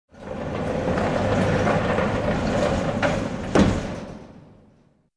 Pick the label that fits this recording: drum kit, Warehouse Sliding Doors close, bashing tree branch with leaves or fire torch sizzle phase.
Warehouse Sliding Doors close